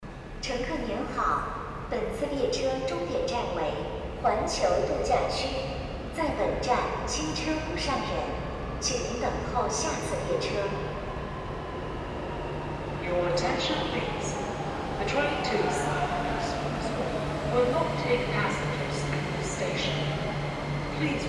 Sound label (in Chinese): 八通线 环球度假区 清车